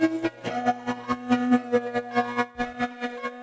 FX LOOP; MISC FX; USB Soundscan vol.60; Asian Traditions; AKAI

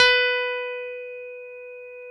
PNO E.Grand; Roland; Keyboards of The 60's and 70's

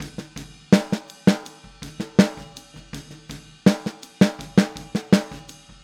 Boogaloo Chorus Snare, 82 BPM

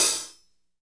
hat slosh

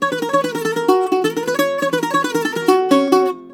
BOUZ F#

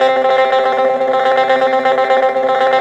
ELBZ TREM, BOUZ ELTR, Mediteranean Traditions; USB Soundscan vol.53; AKAI

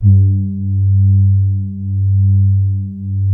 soft brass G2; SOFTTRIX; Music Store - Claudius Diemeter Schwerlos

